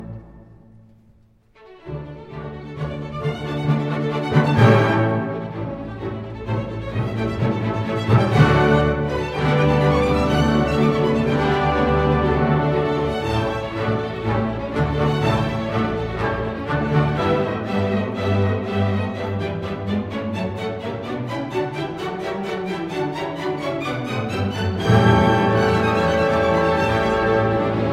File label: violin excerpt; Oberon overture